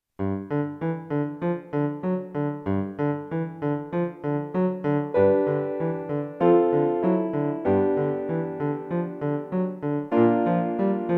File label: Music Box